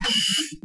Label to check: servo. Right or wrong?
Right